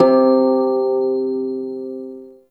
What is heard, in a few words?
Prime Shawzin Oct One C; Warframe